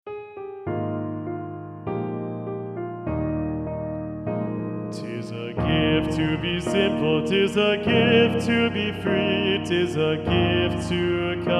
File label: low basses, eb major; simple gifts